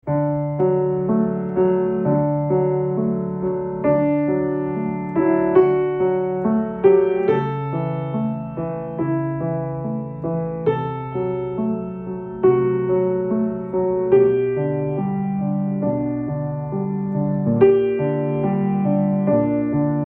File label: Piano Piano; Meltem